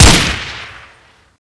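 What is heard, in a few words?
m4a1 unsilenced fire, weapons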